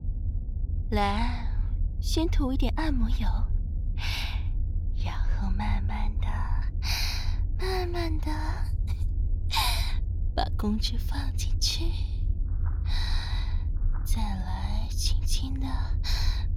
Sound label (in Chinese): 掏耳&清洗耳朵&耳朵按摩&舔耳, ASMR; 為了讓食用動物放鬆，先幫你按摩